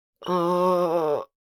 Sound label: Adult female moan headache or pain